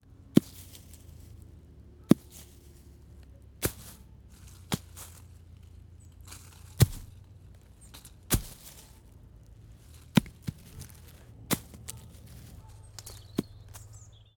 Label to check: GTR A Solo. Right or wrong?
Wrong